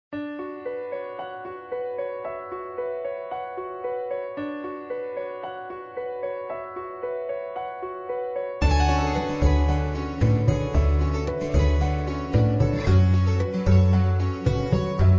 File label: snow